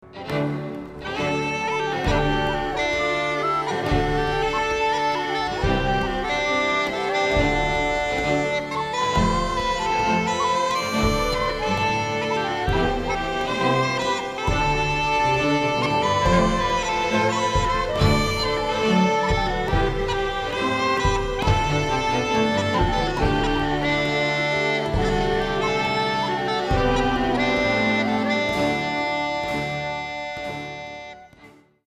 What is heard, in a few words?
Fenian Ballad inst; traditional CELTIC MUSIC